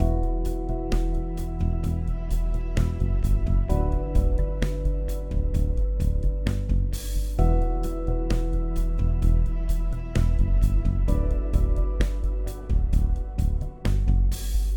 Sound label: Mood Normal Medium